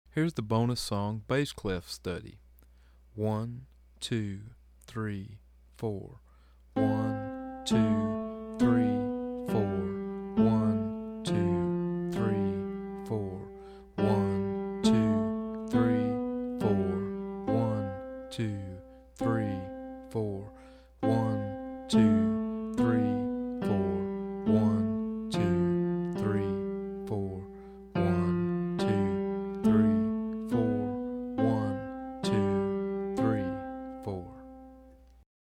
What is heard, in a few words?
Bass Clef Study Slow